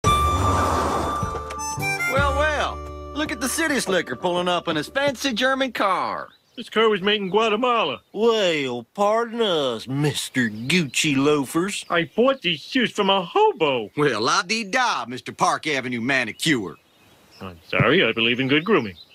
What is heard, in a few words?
Formerly chucks chant